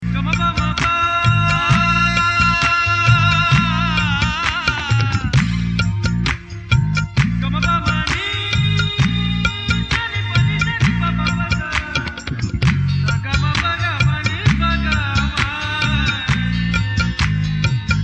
Ga Ma Pa Hymn Melody

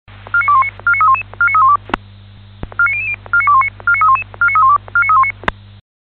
Alarmering

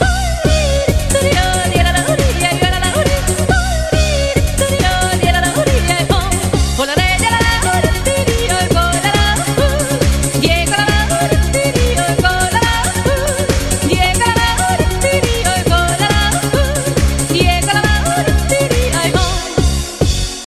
Yodel Dance

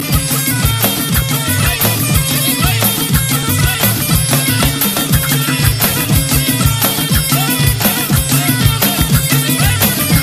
bandari, ringtones

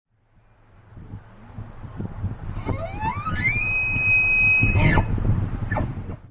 bull elk bugle

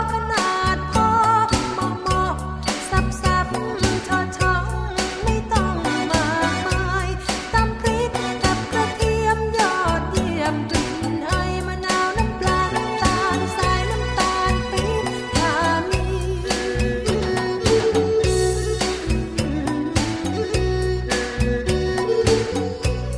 som tum thai song

